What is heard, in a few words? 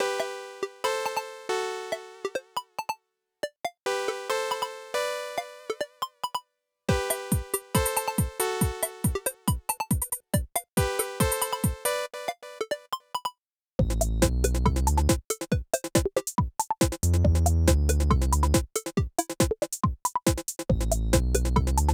Aircheck, Retro